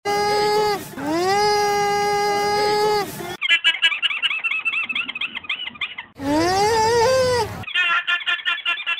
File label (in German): alpaka; haus und nutztiere erkennen